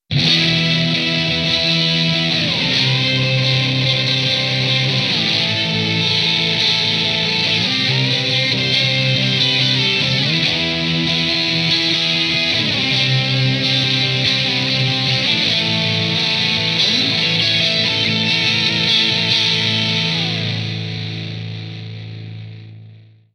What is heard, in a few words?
Maxon SM9Pro+ effect